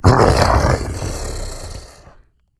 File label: die; the monster plane; kolmods